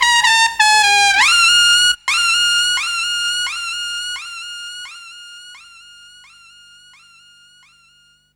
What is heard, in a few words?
Trumpet Delay